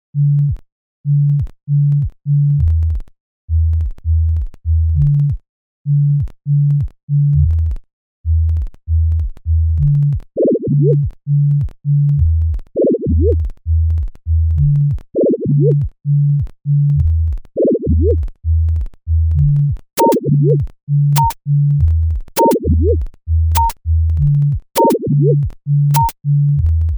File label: fm drum kit